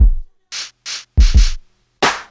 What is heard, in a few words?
Loop